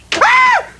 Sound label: ahhh